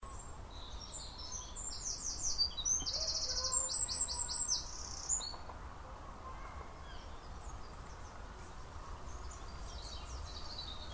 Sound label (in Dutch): Winterkoning, Troglodytes troglodytes